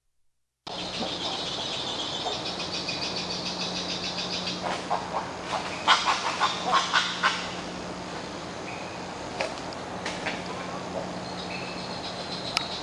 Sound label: Nyctanassa violacea; Comandatuba Island; Brazil; Silveira